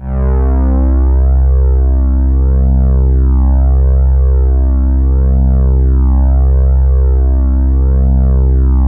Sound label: C1, ANALO STRING; Sound Gallery - Charged